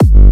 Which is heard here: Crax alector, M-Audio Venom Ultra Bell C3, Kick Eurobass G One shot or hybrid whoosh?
Kick Eurobass G One shot